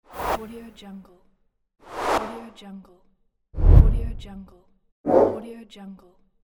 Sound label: swoosh short